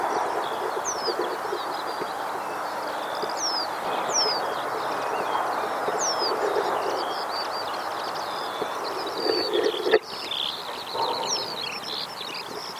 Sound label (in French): bruant roseaux alouettes